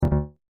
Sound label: alert; watermelongame